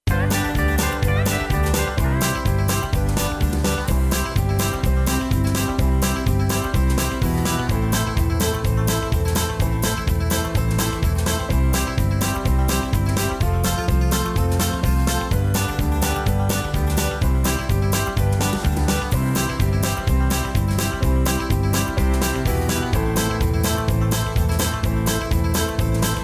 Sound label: come to the door rhythm track, square dance music